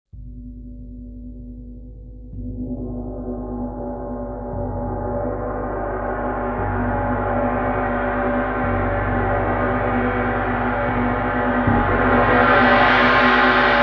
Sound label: 3A Erde Struggle, Gongs